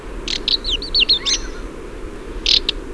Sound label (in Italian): Stiaccino, Uccelli; Suoni